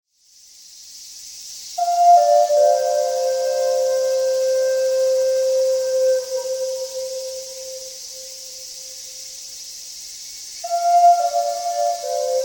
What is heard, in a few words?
indian flute